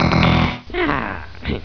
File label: health, items